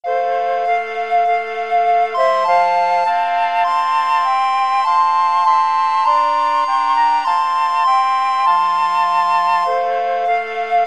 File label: hark, mixed ensemble